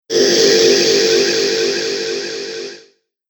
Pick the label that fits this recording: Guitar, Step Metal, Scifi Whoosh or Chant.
Scifi Whoosh